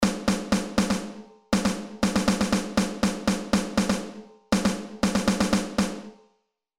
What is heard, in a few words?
Rhythms Snare Drum